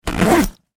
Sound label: Foley Zipper Close Duffle Bag Pocket; Zippers; Objects; SFX Bible